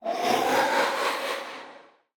Magician Coming

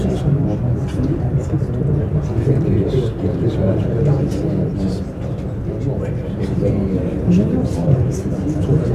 People Chatter

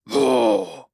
Ruins Great Shield Sentry Attack

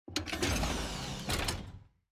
Lobby Exit Door Close

